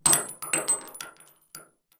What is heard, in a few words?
shell drop single